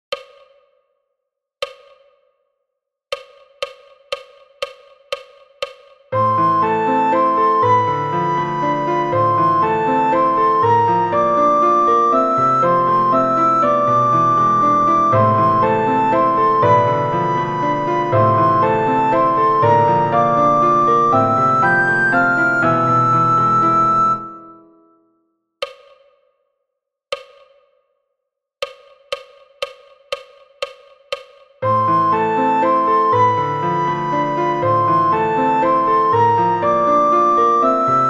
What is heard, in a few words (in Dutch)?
sopraan; Flierefluiter 5